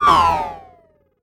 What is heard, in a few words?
Robot Sigh Balanced